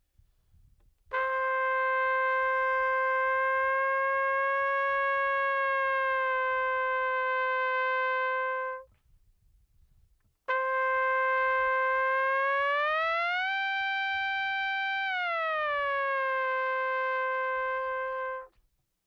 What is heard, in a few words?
Whammmy Bend Notes Up (no Delay)